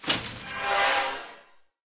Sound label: Casket Exit; Deathrest Casket; rimworld